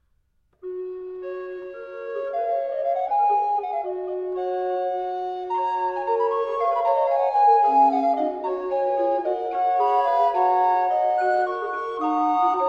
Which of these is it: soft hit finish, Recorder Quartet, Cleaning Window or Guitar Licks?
Recorder Quartet